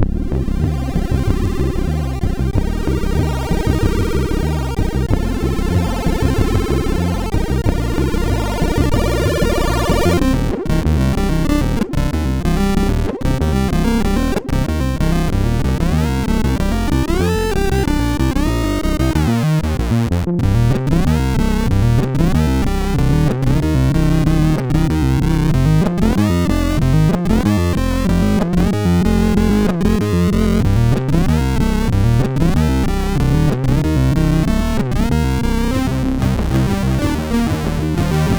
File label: Rock, MOS6581R4